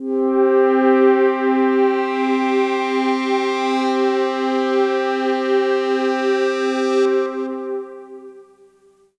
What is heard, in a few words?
Prophet REV2 PAD Poly - C; One Shots; Cymatics; Fantasy Synth Sample Pack